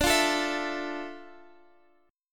D Minor Add 9th; Dmadd9; strummed; JGuitar

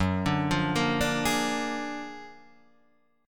F sharp Augmented Major 9th F sharp; arpeggiated; 2,5,3,3,3,4; JGuitar

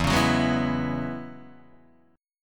E 6th Add 9th E down Guitar Open D

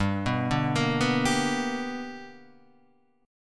B Minor Major 7th Sharp 5th

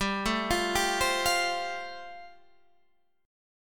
G 7th Suspended 2nd Suspended 4th; G7sus2sus4 arpeggiated

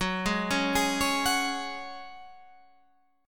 Gb Suspended 2nd, Guitar Standard; Gbsus2 arpeggiated; 14,11,11,13,14,14

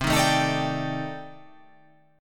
C Minor 6th Add 9th C

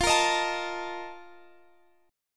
Bb Major 7th Suspended 4th, F, chord

